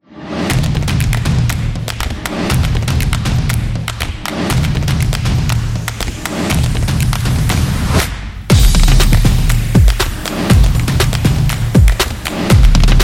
On Hype

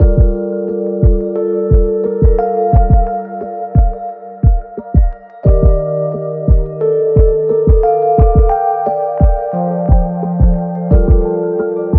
Luxury Ambient; corporate music vol 22

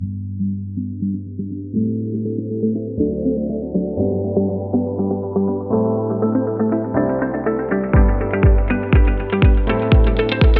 Educational

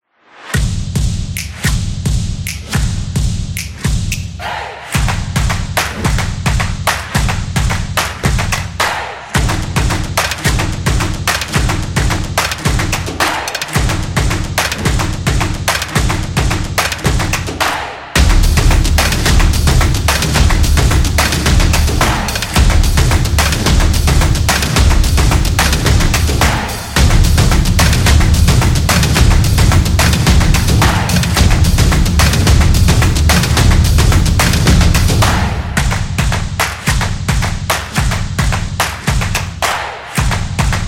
The Drum Beat; high energy

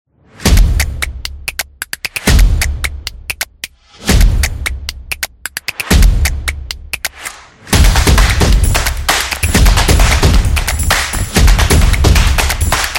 This Is Beat

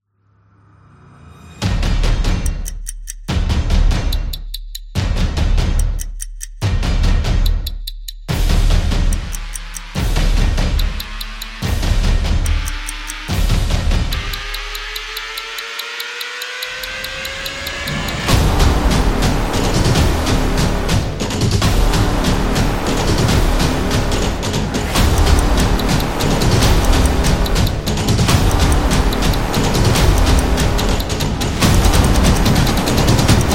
A Sport Trailer, football music

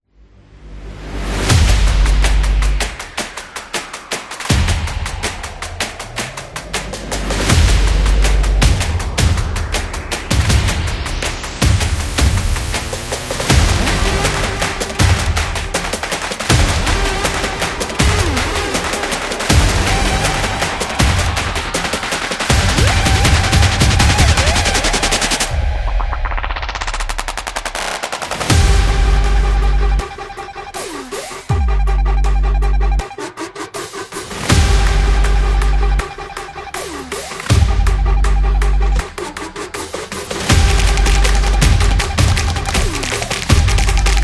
Opener

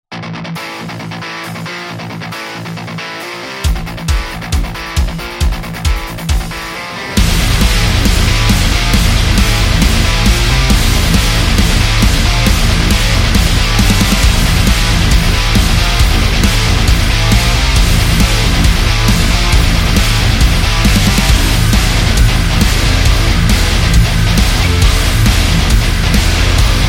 Industrial Metal

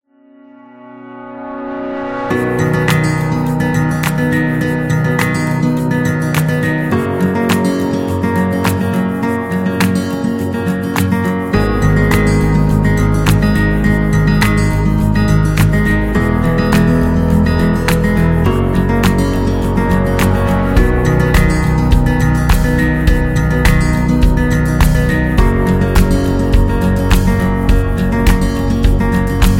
Acoustic Uplifting

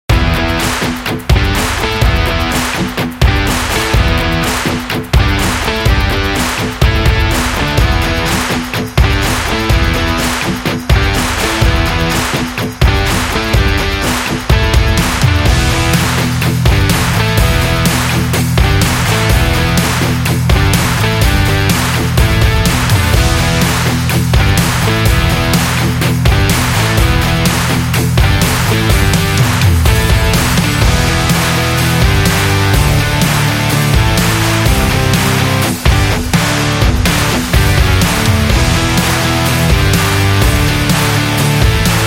Rock School

